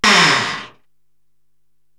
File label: Industrial Moan, Korg TR-Rack drum machine